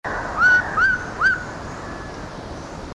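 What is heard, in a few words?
coquerells coua, Madagascar; Madagascar - 20th Oktober to 16th November 2003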